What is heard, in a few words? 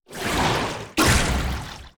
water golem strike; Shelter